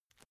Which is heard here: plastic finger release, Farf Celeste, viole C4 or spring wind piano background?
plastic finger release